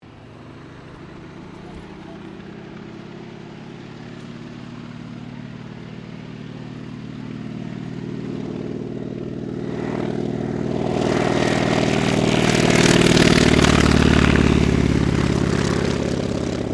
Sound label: AIRCRAFT CAC WIRRAWAY TAKEOFF; CA-16 Wirraway; Airplanes (Sound Effects:Vehicles)